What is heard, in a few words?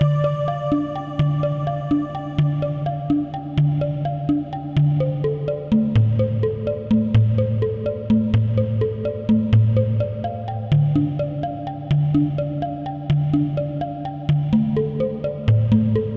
Lost Island Looping; fantasy